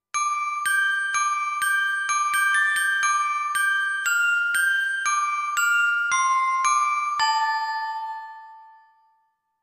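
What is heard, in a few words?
Rama Cymbal, digital piano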